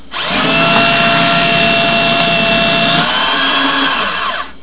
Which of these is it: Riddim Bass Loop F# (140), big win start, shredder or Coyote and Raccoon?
shredder